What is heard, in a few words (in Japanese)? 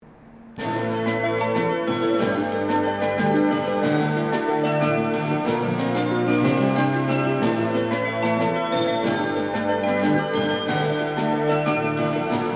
13番のりば発車メロディ, 新幹線ホーム; 筑後船小屋; 到着放送被り無しでフルコーラスは、退避列車・始発列車を狙うしかありません。